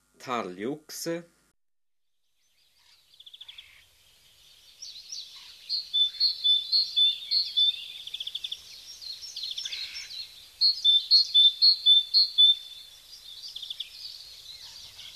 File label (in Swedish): Talgoxe